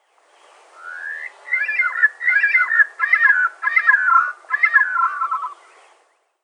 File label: orig half Speed